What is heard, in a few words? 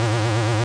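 invader, VIDEO GAMES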